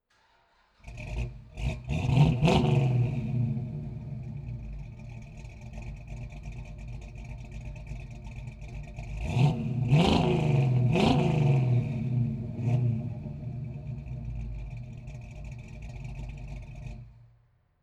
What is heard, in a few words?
car camaro start rev exhaust